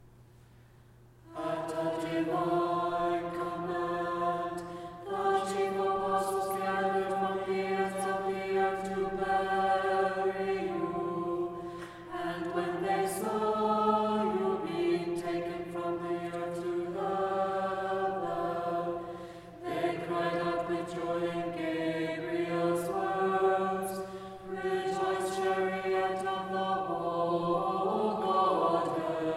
Tone 4 Common Chant Sticheron Example Unison

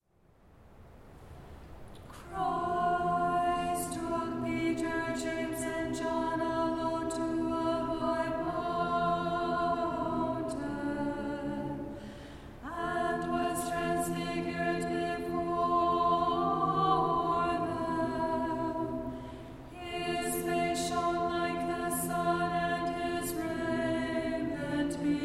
Tone 8 Common Chant Tutorial Alto

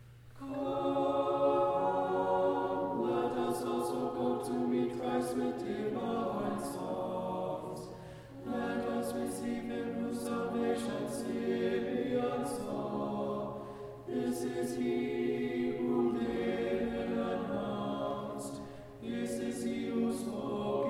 Kievan Sticheron Example Tenor; Tone1

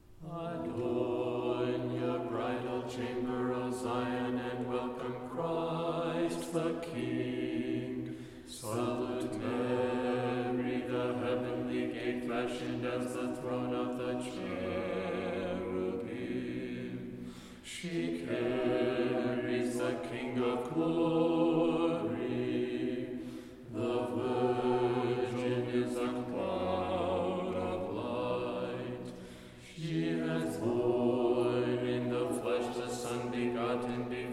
Tone 7 Tutorial Common Chant Bass

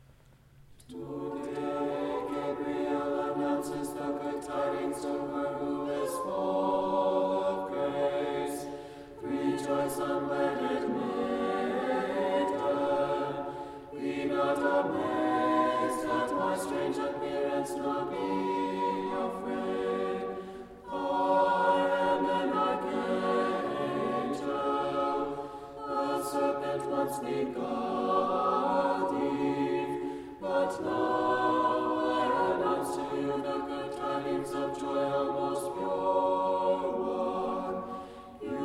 Kievan Sticheron Example SATB; chanting tutorial

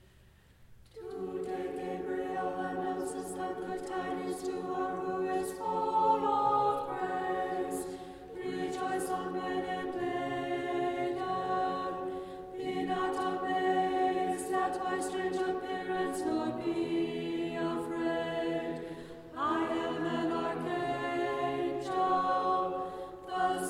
Tone2 Common Sticheron Example Alto

